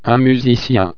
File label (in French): musicien